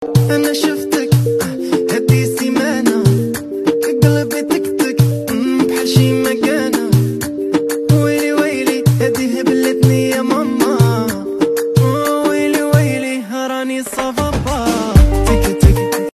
shoti arabi

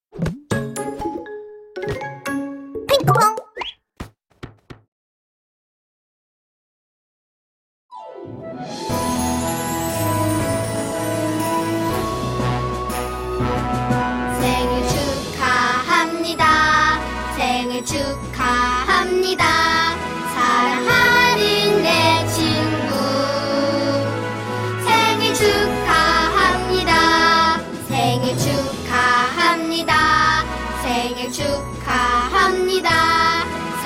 happy birthday to you

